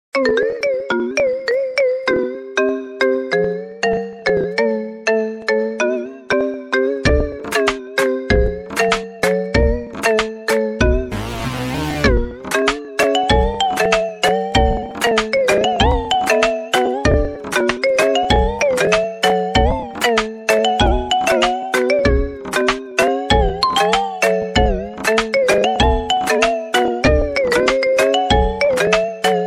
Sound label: Marimba Funny Remix Ringtone